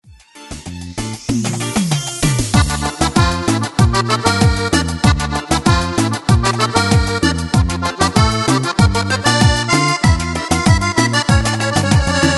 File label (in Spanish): Cumbiando remix